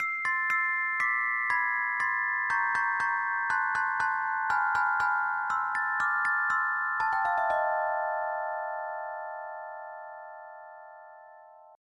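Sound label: celesta; Roland - An Invitation To The Roland World Of Music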